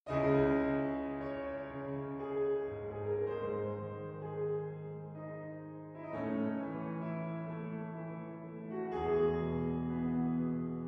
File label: distant mic